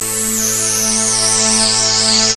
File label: TECHNO; X-Static Goldmine 1